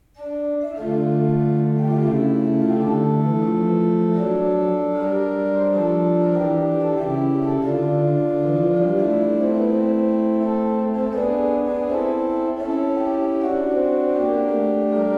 Stahlhuth, gebrauchtorgel

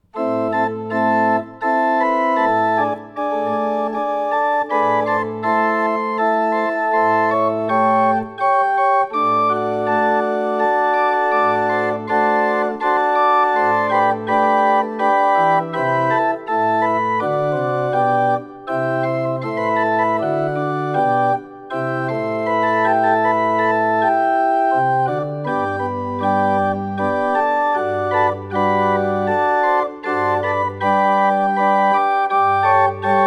Schmid, gebrauchtorgel

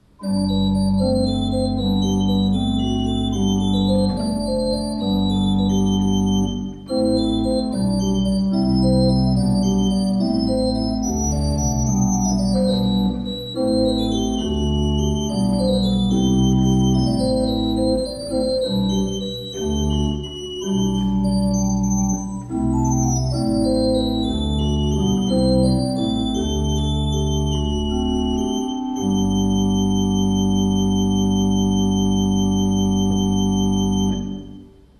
Walcker, gebrauchtorgel

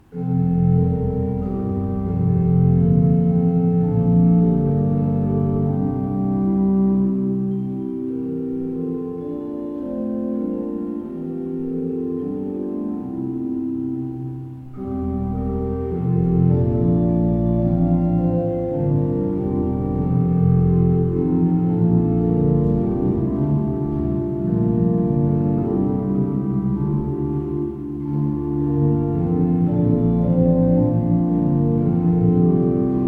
Beckerath, gebrauchtorgel

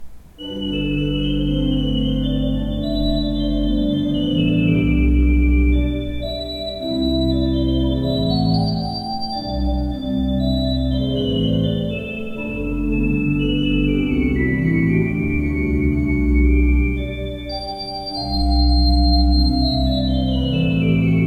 Breil, gebrauchtorgel

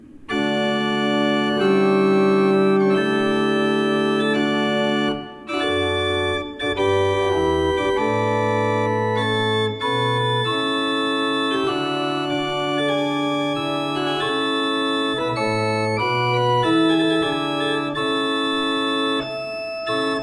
Offner 4 I, orgel

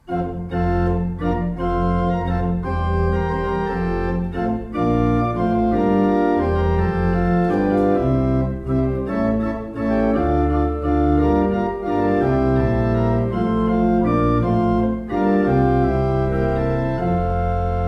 Walcker, gebrauchtorgel

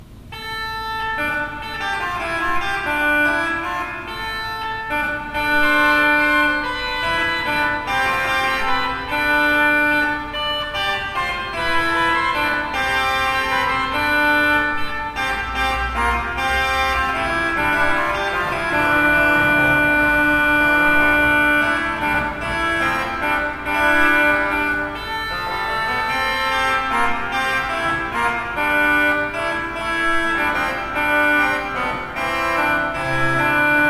Fuehrer, gebrauchtorgel

